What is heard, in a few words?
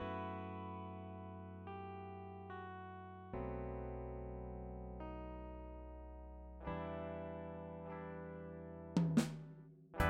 blues eyes guitar ensemble